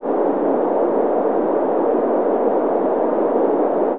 wind

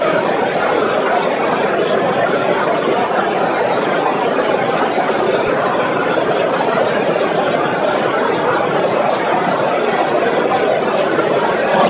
crowd

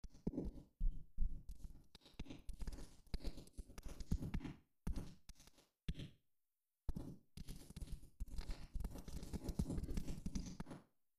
Room Small; tcmegaverb; reverbs

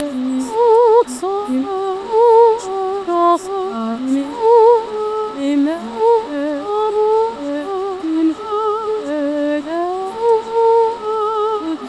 singing

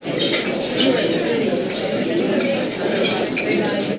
crowd

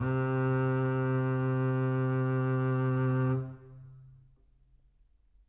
contrabass; interpolator

